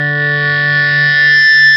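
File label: FEEDBACK D2, Classic Guitars; E-MU Formula 4000 Series Vol. 3 – Analog Odyssey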